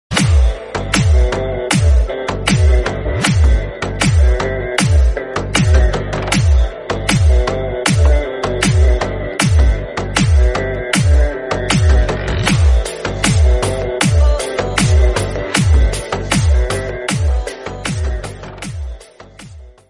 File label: background sound mobile ringtone; don